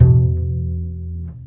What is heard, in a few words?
TRICKS, DBASS; Univers Sons; Jazzistic